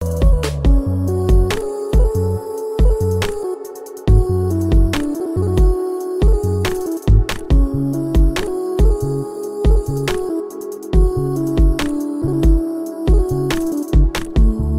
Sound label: Ringtone